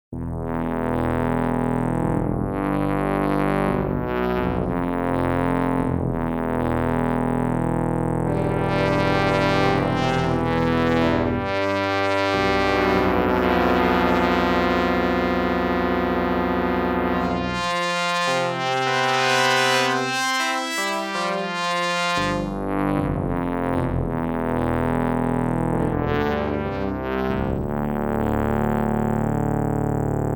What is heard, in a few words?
horn